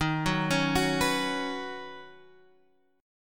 D sharp Minor 7th Sharp 5th D sharp, down, Guitar, Drop D